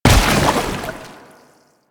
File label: Step Water, Footsteps; Warframe; Lasrian; Lasrian Soldiers; Foley; Lasrian Chem Strike Nox